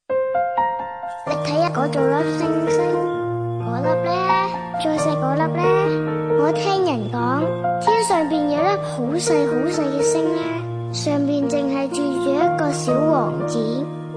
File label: chinese child